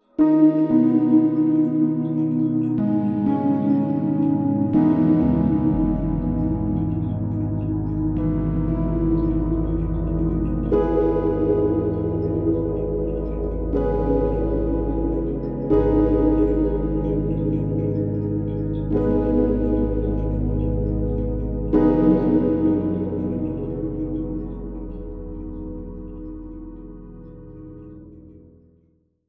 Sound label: Piano Impressions